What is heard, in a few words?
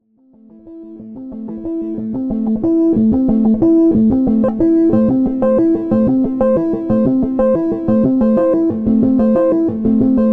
Env Reso Example